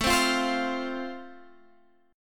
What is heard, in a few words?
A Minor Sharp 5th A, Guitar, Drop D